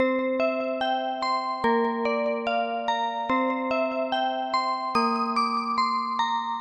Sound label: theme loop slow bells